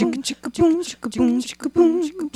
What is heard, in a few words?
Vocal Echoes